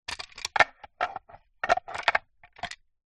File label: leisure chess game set box lid open movement gentle